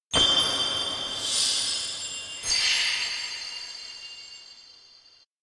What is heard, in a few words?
shooting star multi; anemoi